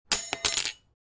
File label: Dropping a knife onto a hard surface heavy impact